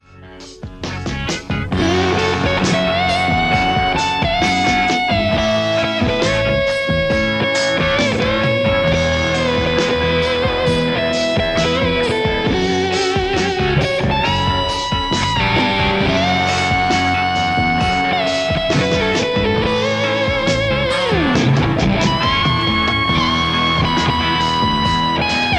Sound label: lespaul; overloud th2